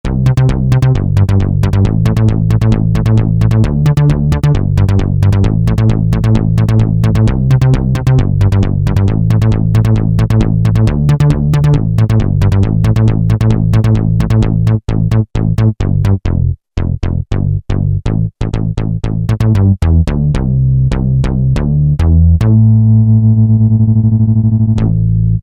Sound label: Euro Bass, Alesis Z2